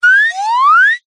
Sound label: cartoon slide whistle ascend climb fast